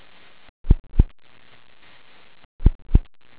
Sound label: sonic boom